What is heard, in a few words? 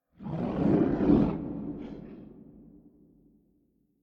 Creature; we are the dwarves gamerip 2016